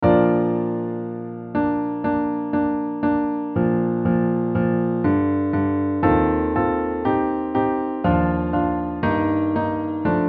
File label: Piano